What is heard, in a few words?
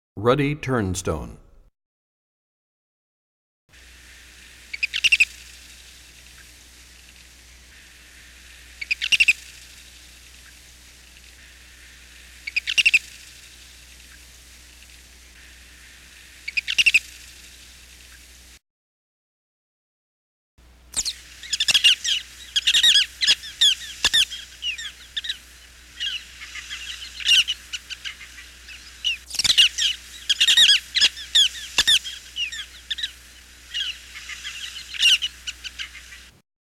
Ruddy Turnstone, Bird Songs; Animals; 101BirdSongs